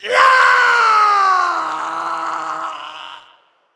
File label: Wound Crit; Bolvar Fordragon